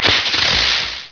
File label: spit; mk2; Reptile